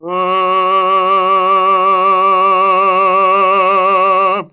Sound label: "noted", Voice